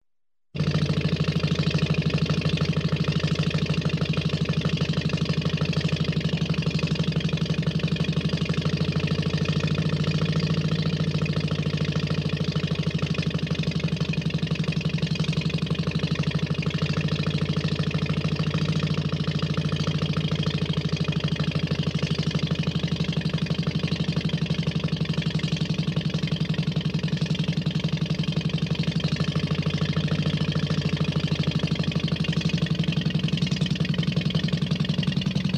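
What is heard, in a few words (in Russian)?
бот двигатель старого типа работа на холостом ходу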